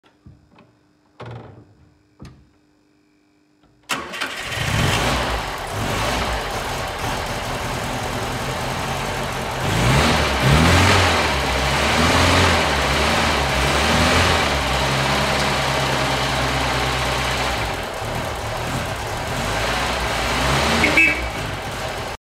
Borgward

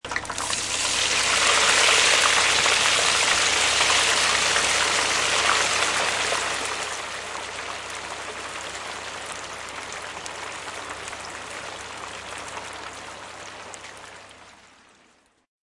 deep fryer

